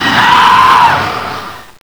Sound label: die, horror; slender